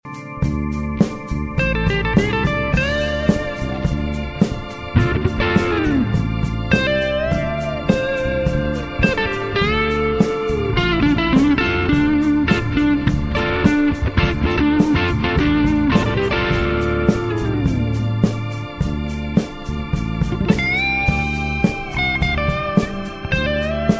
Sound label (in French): solo guitare